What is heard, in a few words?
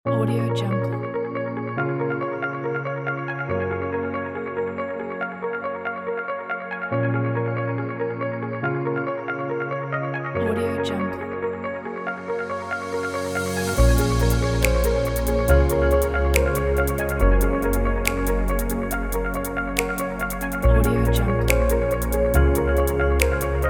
chillout; flappingtunes